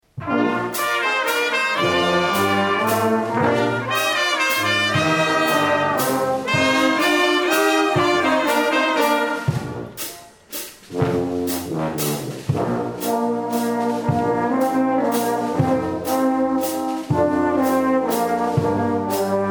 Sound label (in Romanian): Fanfara